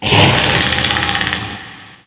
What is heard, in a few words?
pain; predator